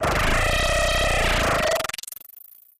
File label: DEATH RAY